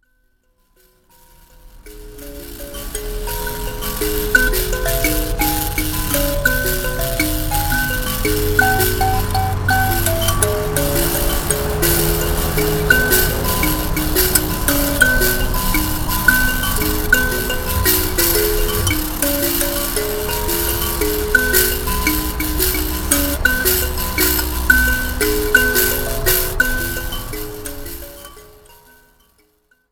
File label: mbira